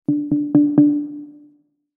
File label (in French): Entree zone de controle